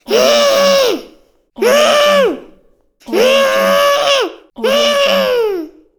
child growl angry

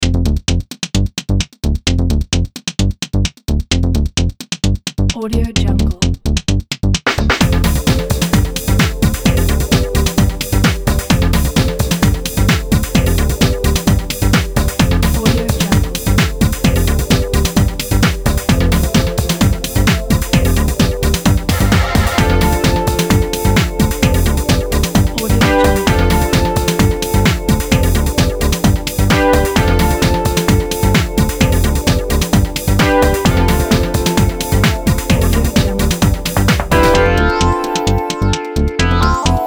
motion technology upbeat edm

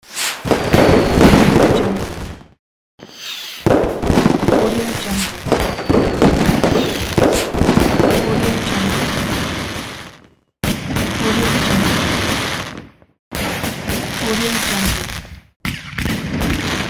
firework

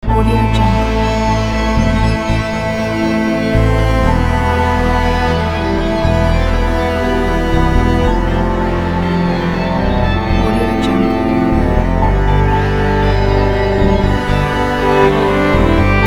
space exploration intro

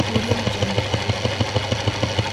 scifi clunky ventilation system loop

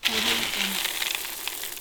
small hard debris rustle; stormwaveaudio

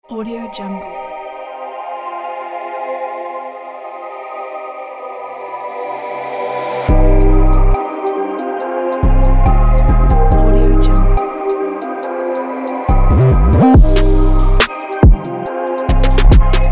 dark trap hiphop beat

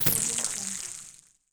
scifi biomechanical impact

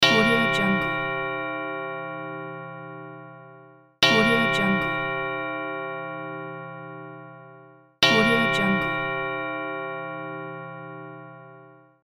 church bell

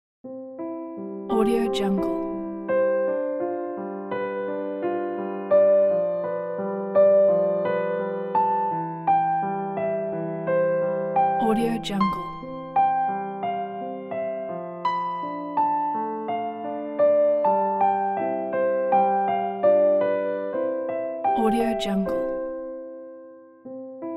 tender classical piano lullaby

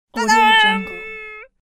female funny show up shout

